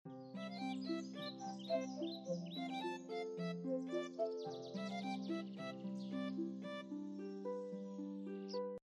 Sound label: music background nature